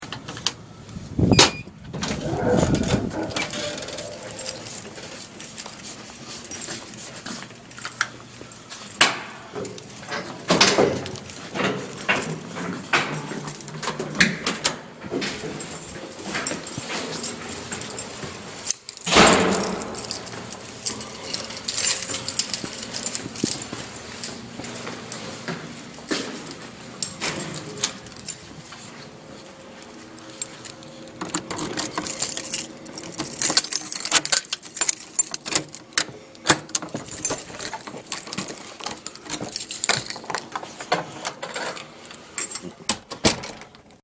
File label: Entering Going to Room, door opening